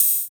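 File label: german open hi hat; Drums In Your FACE!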